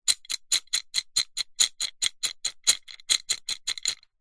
food savory snack wasabi peas in ceramic bowl shake toss; zapsplat